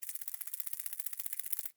Millipede Walk, Animal, Millipede, Walk, Centipede, Movement, Insect, Bug